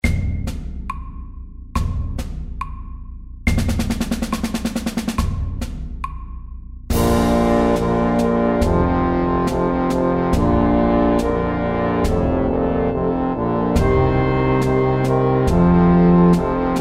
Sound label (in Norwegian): Elefantmarsj SAKTE